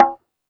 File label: Tabla, Yamaha DD-20